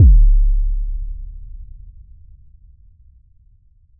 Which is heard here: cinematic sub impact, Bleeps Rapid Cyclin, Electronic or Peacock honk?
cinematic sub impact